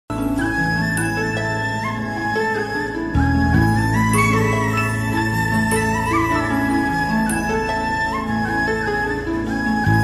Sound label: BGM Ringtone